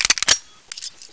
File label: 357 reload open, weapons